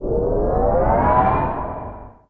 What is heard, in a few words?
Sprint; chaos league